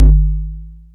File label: Kick, Nord Modular Drum Samples; Yawgmoth